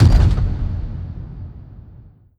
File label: distant step, strider steps; creatures; Half Life Model Pack